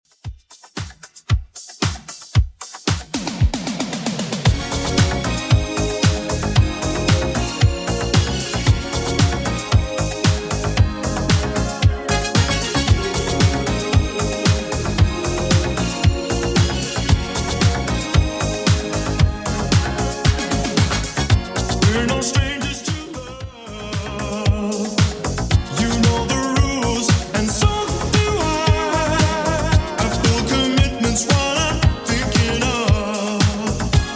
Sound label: 80s Redrum 114bpm